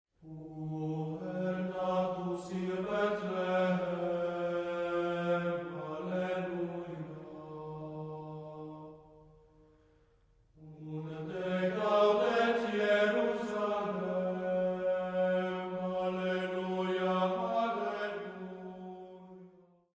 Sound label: Puer Natus In Betlhlehem, boys choir; Sounds Colours; Puer natus in Bethlehem, anonym; Sofia Boys' Choir